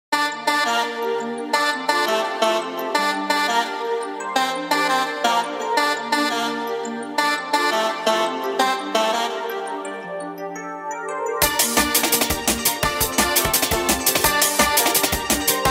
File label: hardstyle remix